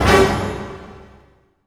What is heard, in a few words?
HIT G, Orchestral Loops; 128 PREDATOR